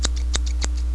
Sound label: Scissors; SketchBook 2.1